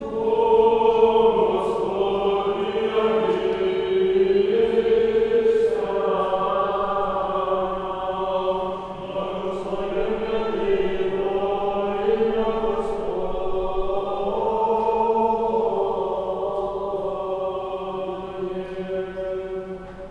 5 глас. Бог Господь. Рогожский хор

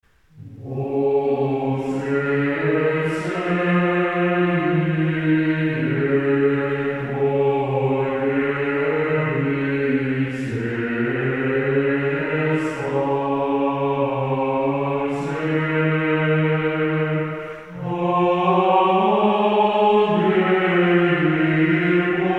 Стиховна 6 глас; Воскресение Твое

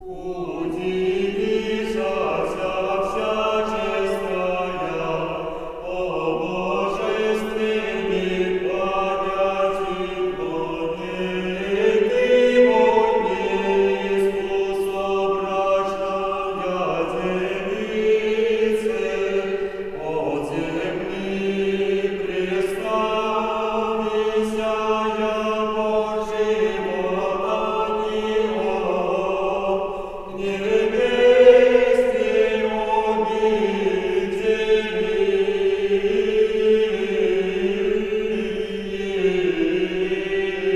Ирмос 5 песни Успение 4 глас 2 творец

